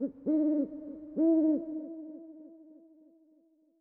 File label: owl; ambient; Team Fortress 2